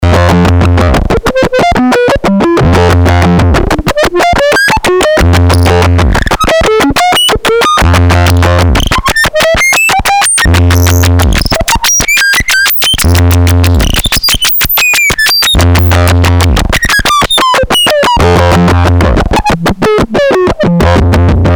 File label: TS303 vs MS20